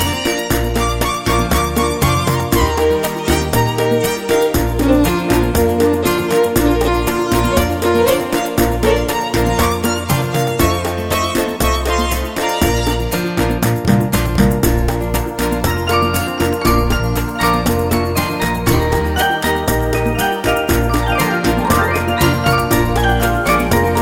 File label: Rumba